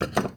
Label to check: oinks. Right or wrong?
Wrong